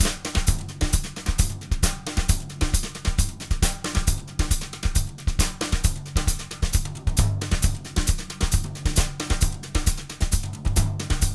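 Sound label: brushes samba; BRUSH LOOPS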